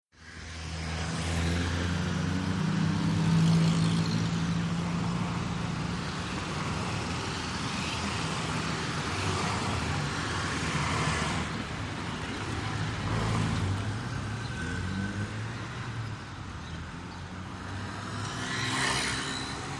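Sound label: Paris Traffic Small Boulevard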